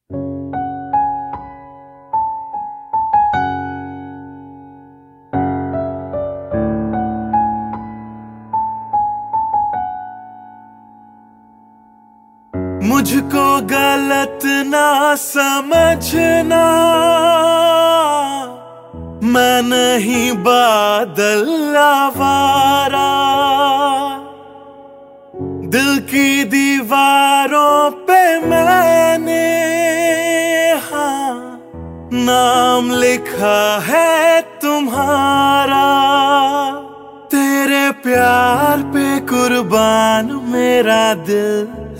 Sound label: Sad Unplugged Cover